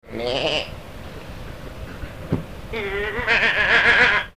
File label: Bleeting; Cordoba; mission audio